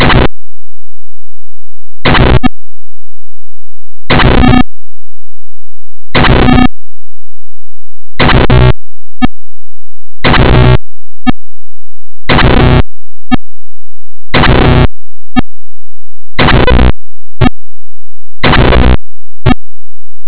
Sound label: bytebeat